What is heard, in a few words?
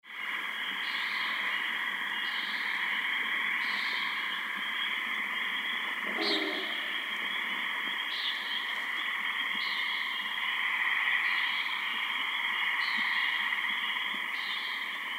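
Common Nighthawk Distant Booming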